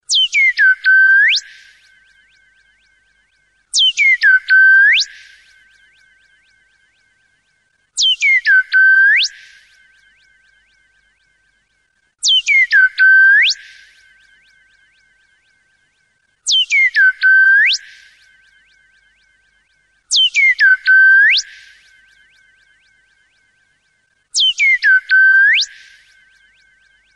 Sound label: Bird Call